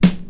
subby snare sound f key, steel snare drum sample tuned to the F note